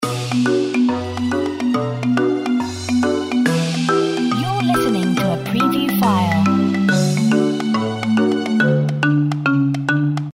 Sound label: Fairy Tale Loop